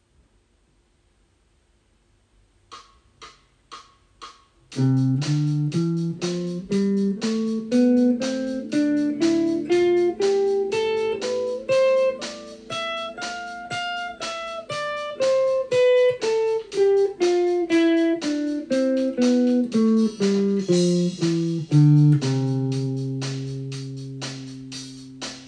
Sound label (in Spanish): escala mayor